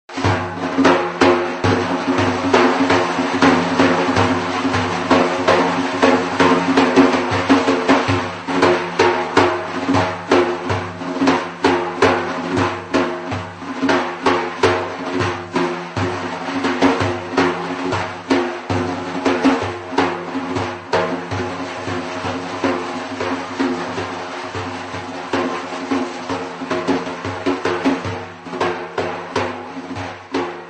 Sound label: daf